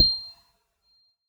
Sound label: Glittering Clink